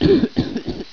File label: WASTE HIT